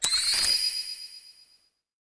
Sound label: SFX - Menu Purchase Successful; uno